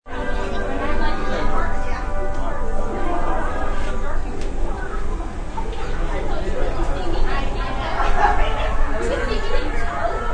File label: Ams Prk Lne, Crowds waiting in line for roller coaster.; Rides; Sound Effects:Games